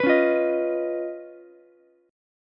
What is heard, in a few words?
Db 9th Db down Ukulele C6; strummed; JGuitar